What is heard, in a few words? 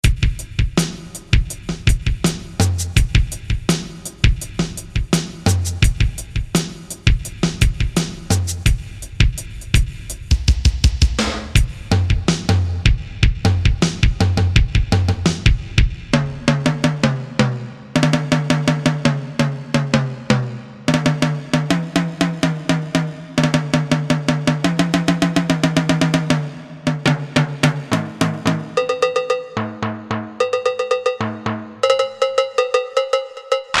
Percussion, Korg MSC-3S Drums